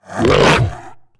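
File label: tukata attack; Star Wars Knights Of The Old Republic I; XBOX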